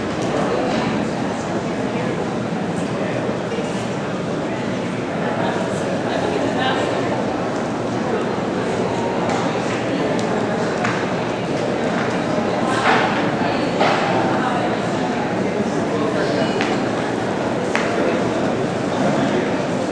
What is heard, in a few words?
marketplace, ambience sounds